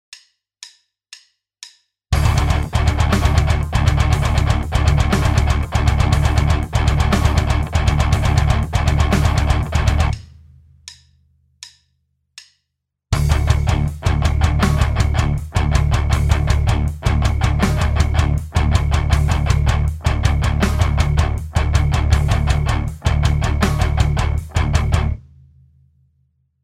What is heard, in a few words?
rhythm